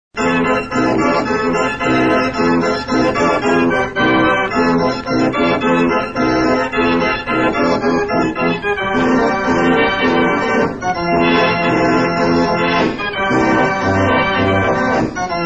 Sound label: Swing